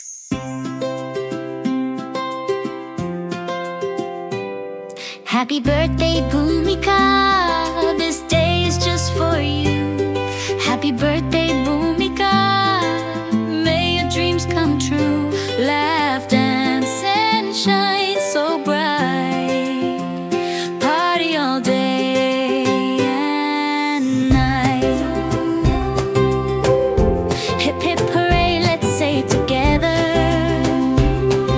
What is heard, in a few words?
Happy birthday Song